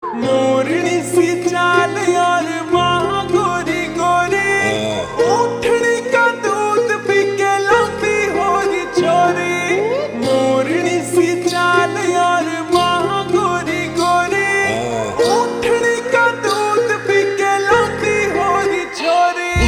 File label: Ringtone slowed and reverb